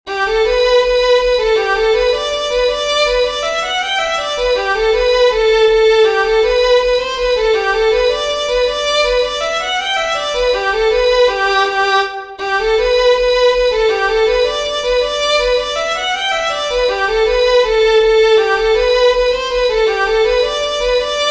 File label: REEL, fiddlers; southern kings fiddlers